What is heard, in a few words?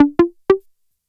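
SYNT1 Left, FUNK; USB Soundscan vol.47; AKAI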